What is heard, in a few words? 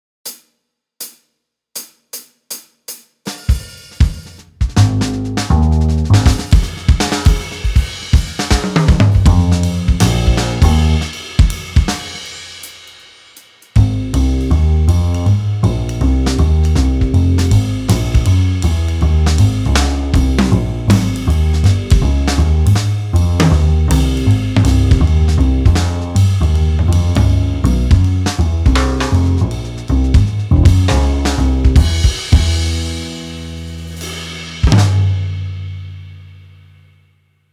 piano bright swing 160